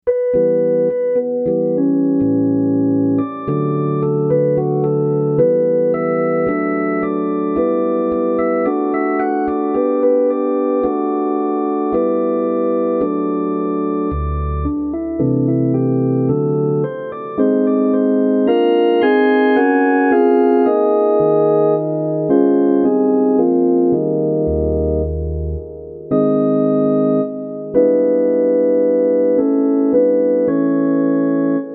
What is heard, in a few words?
Organ Acc